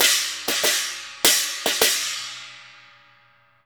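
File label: CHINA LG17 L, China cymbal; Spectrasonics; Heart of Asia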